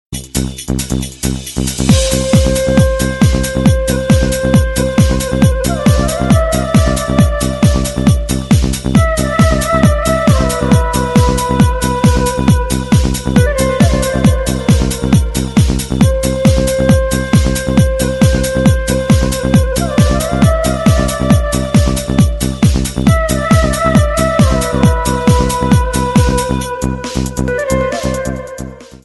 nice flute drum ringtone

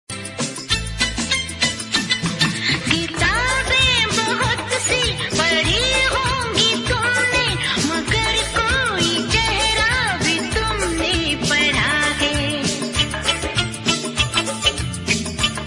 hindi romantic

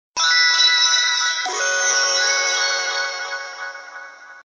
original nokia sms

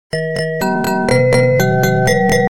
sony xperia 3d sms

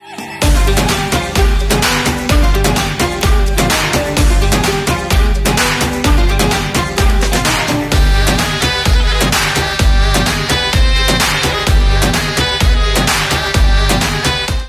music ringtone; marjaavaan

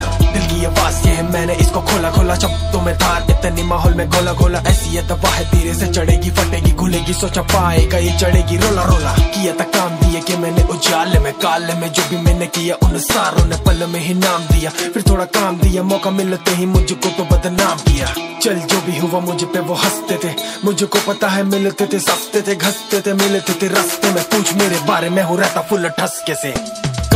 rap song ringtone

